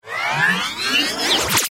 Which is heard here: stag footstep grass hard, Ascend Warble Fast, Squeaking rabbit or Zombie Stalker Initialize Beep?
Ascend Warble Fast